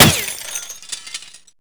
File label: glass bullet impact; Rust